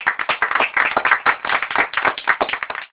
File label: Clapping; Audiovox CDM-9200